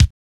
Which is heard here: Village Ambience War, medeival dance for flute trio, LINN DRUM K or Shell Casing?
LINN DRUM K